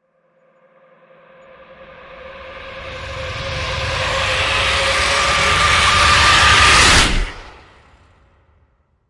Agony Hit Swell; Ninja Tracks

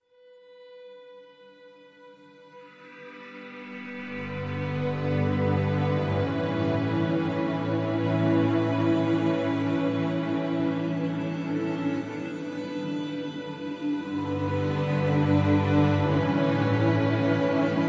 Wisdom Ambient

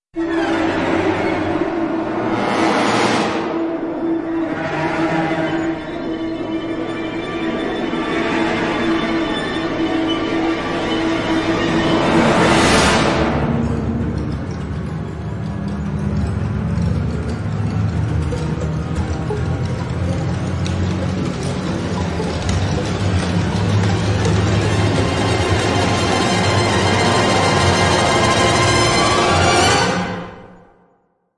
Pounding Percussion Taikos; Pounding Percussion vol. 3

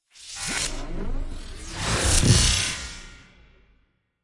Impacts Abstract Explosion; Gothic Storm Music; Advanced Sound Design 2012

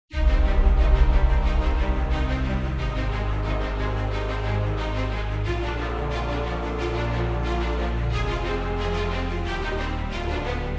No Vocals

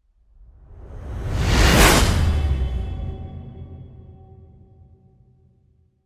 Iron Wipe; Ninja Tracks

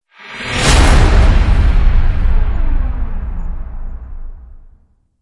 Starscream Hit Swell; Ninja Tracks

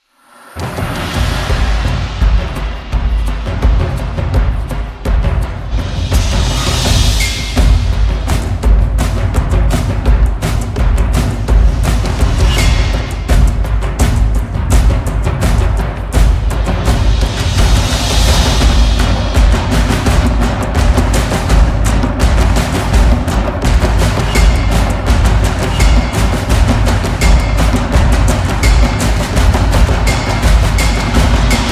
Tribal Throb

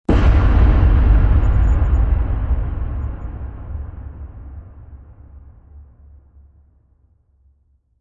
Hit Deep

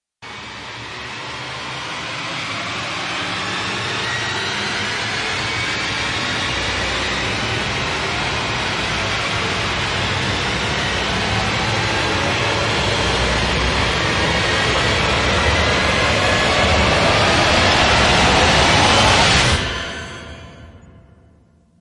System Rise

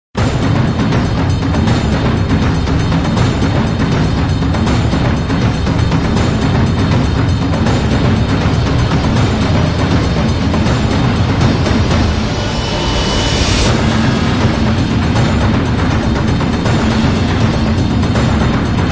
Trailer Drums from Hell (Speed Version)

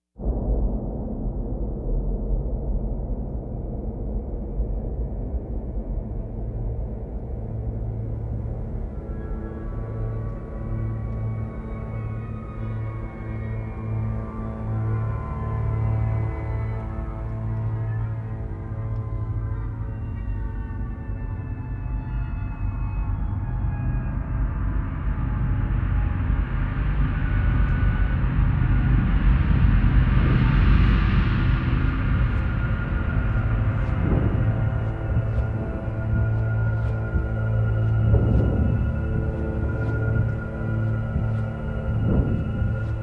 No Synths; Ninja Tracks

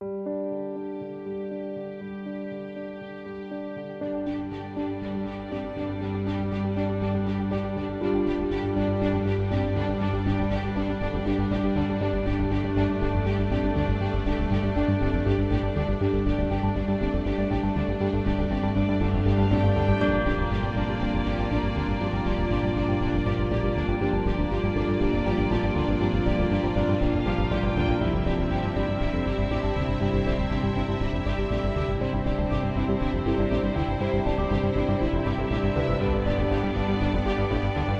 Luxurious Ambient

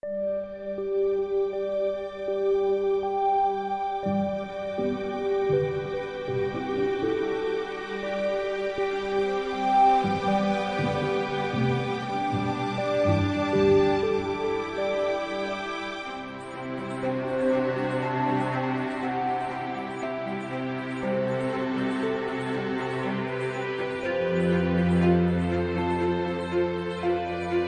New Ambient

